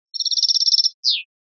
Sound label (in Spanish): terminacion rechio, campero